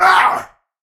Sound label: Death; Warframe; Tau; Dax; Ashigaru